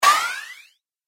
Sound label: Cartoon Rising Hit; Cartoon Sound Design